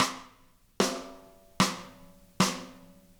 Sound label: rims